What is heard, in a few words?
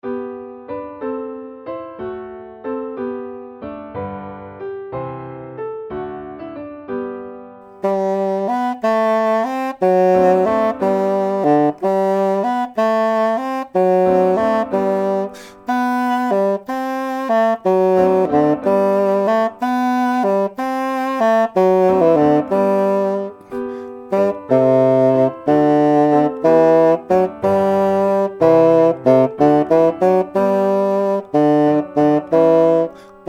barcarolle hilling sol 92, basson